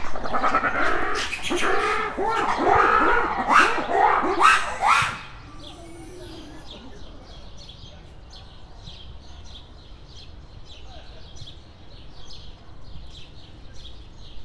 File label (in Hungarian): rövid összezörrenés, vari; debreceni zoo 2012